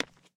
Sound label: concrete step; Golden Crayon Sounds